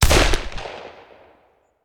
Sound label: Hand Gun; Sound Ideas; Guns